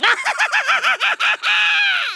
LAUGH; Worms Armageddon; Kamikaze

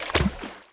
change water type

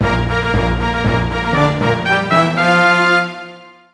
Italy, Fanfare; 1997 MMX LAN PACK (win 95); Worms Armageddon

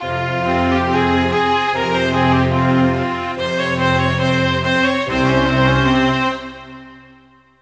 Morocco, Fanfare; 1997 MMX LAN PACK (win 95); Worms Armageddon

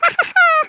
LAUGH, Swedish

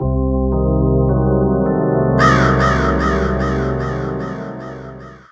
Horror, Fanfare; 1997 MMX LAN PACK (win 95); Worms Armageddon

